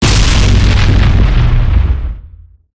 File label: security bot explode; gow